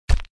potion

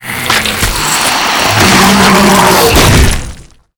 grell deth

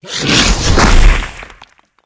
stone attack

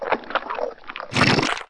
crag turtle attack, monster

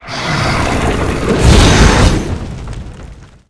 attack, monster

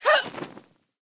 dark mage damage, monster

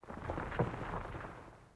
desert; forgottenruin

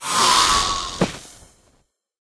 naga dead, monster

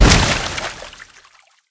skill explo

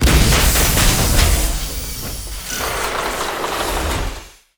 robotkid death, monster

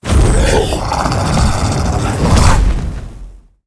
demon deth, monster

